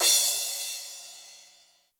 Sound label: Crash, Yamaha RY20